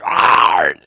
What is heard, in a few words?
die zombie; cstrike